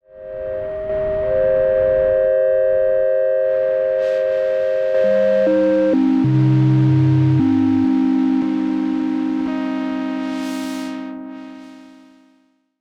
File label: CHORD PAD; Vanilla Vla